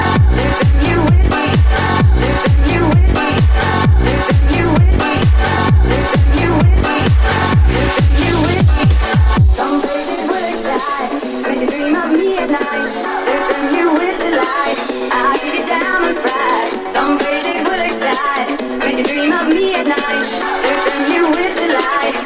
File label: House Music